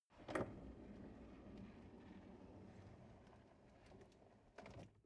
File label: drawer medium close draw long